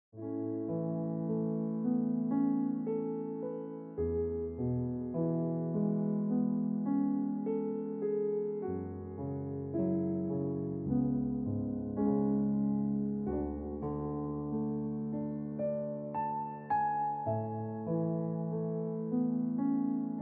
Ringtone Piano Music

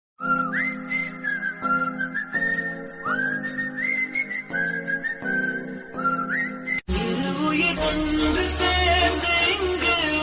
Song In Tamil

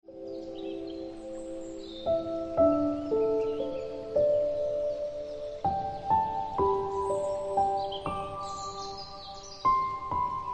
Ringtone Piano Music

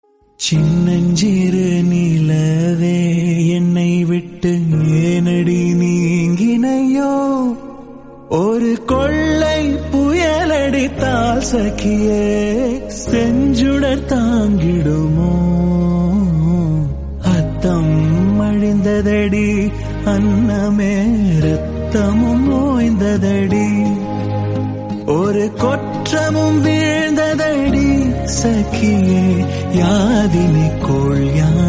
Tamil Song Ringtone; PS2

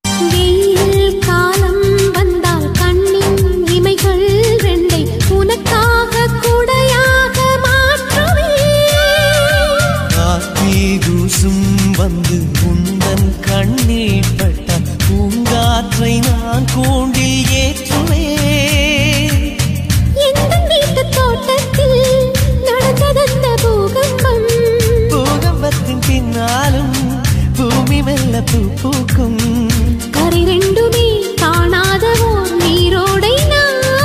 Ringtone

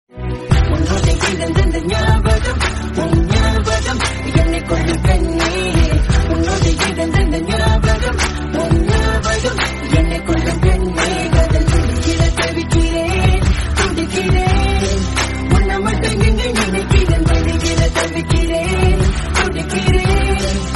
Tamil Song

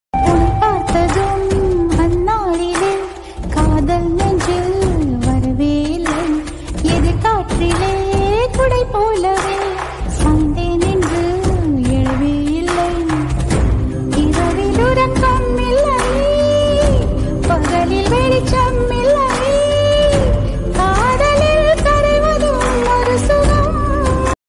Serial Song Tamil; Vaa Arugil Vaa Serial

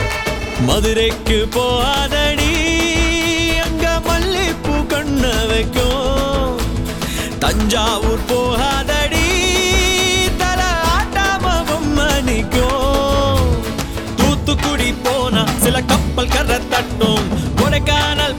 Tamil

